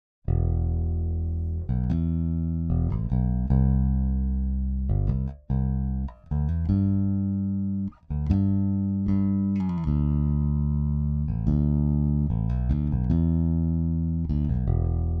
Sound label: bass